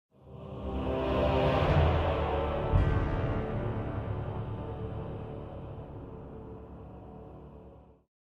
Chest Discovered

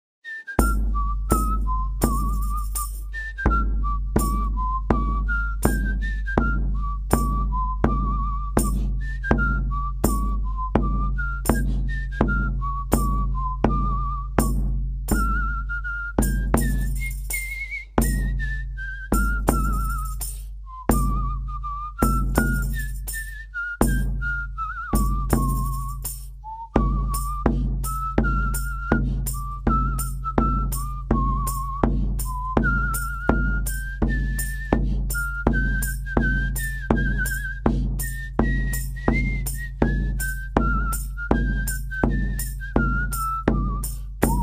Drums

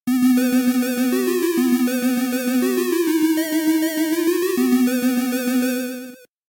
Start; Jetpac; BBC

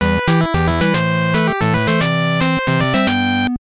Car; Hollywood Squares

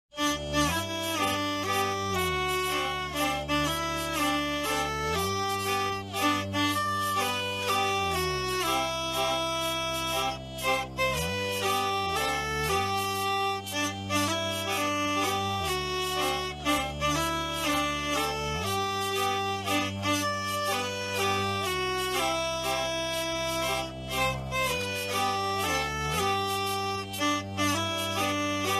HappyBirthday Hurdy

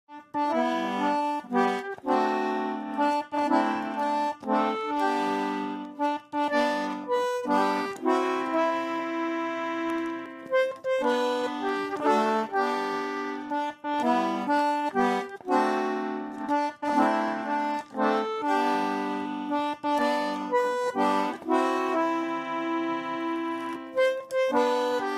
Happy Birthday Concertina

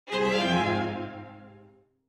Chest; Arena; Regal Hound Chest II

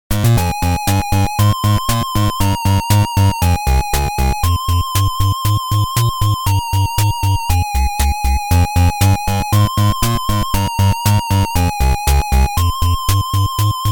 Fire Elemental; Ironsword

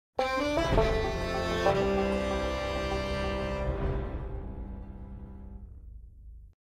Reward Earned

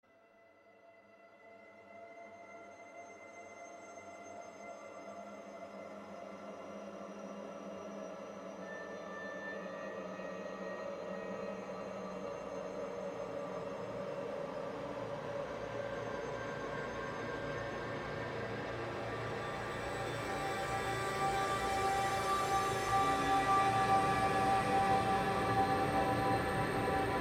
Ambience; Devils Shroud

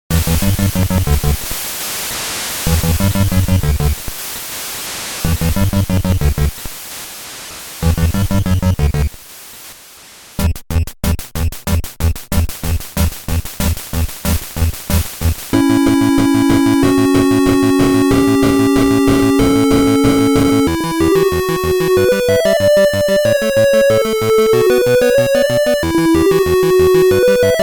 Wind Elemental; Ironsword

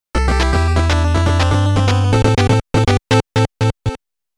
Game Over

